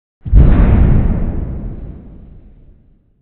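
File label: Explo Thunderous Deep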